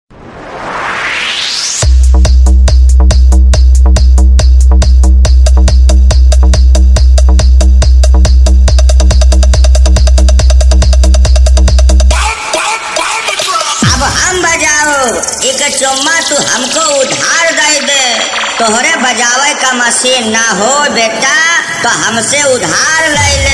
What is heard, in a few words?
Competition Sound Check Vibration Competition